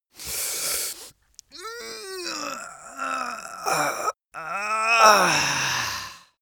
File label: male stretch; menschen; koerpergeraeusche